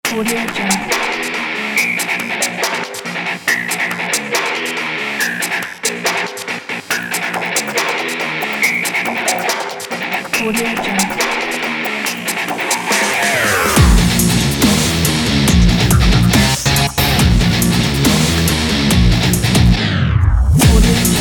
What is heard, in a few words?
furious jingle